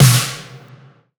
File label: SNARE